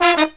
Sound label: LOW HIT, Brass; USB Soundscan vol.29; Killer Brass Riffs; AKAI